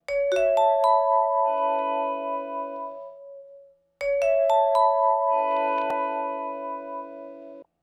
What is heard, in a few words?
Vibes, loops